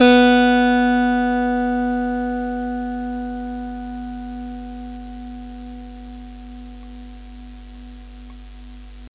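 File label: guitar long sound with muting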